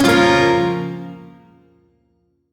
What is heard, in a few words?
Shawzin Hirajoshi Chord; Warframe; Duviri